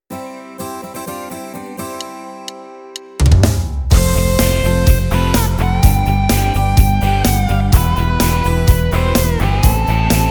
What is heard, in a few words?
Red Guit Rock